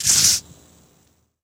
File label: Bottled Drink Twist